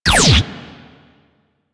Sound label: Bronco Prime Fire Shot 2D; Warframe; Weapons